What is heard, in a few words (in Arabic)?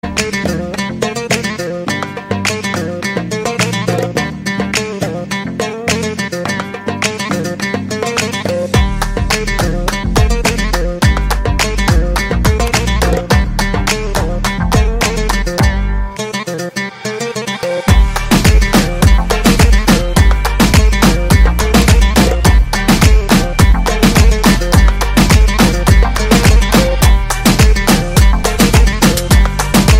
arabic, نغمة موبايل خليجية عود عربي